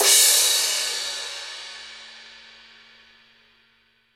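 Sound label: drum crash c sharp key, drum crash tuned to the C# note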